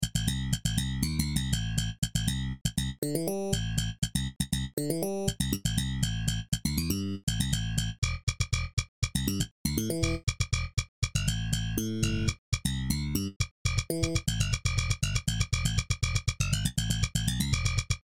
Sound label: Slap Bass; Bass Exercises